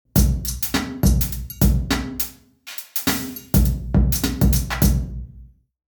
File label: main level drum, creswell crags